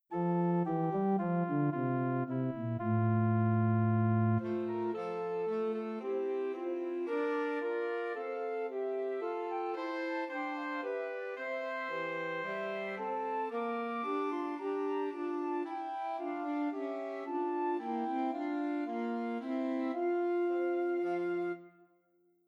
While Shepherds Watched, Christmas Trios for Flute Alto Sax and Tenor Sax